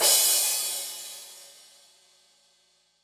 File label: Crash, Cymbals